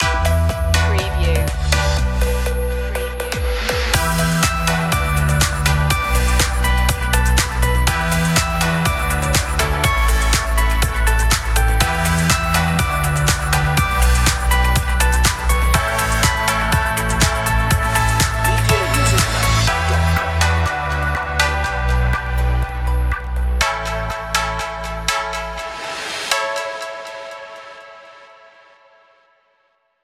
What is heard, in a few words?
sweet dreamy inspiring house